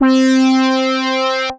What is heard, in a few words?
Positive E Brass, Synth Brass; Ableton; Creative Extensions; Poli